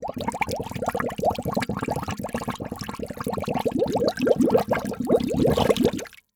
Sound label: Liquid Water Bubbling In Metal Bowl Light To Heavy